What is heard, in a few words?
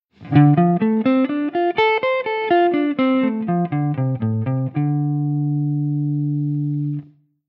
d min7 arpeggio, guitar